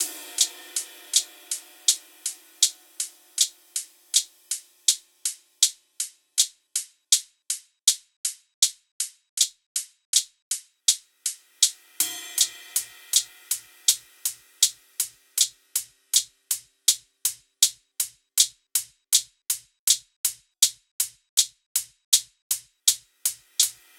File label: hats 80, Hat Loops; drum sample pack; SEISMIC; Slate